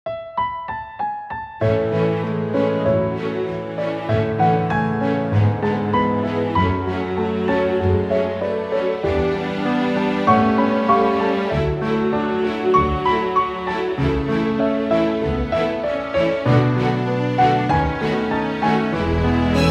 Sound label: piano arrange; SOIKANO